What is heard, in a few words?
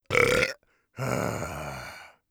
burp, orc voice; Orc Voice Pack